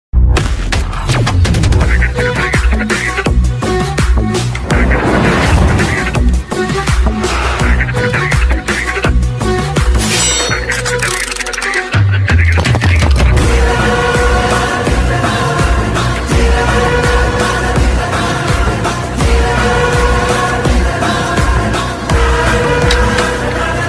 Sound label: Bgm Ringtone; Ghost Big Daddy